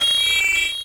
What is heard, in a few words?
MET SW SIN, SWORDSING; Total Control - Action Cutz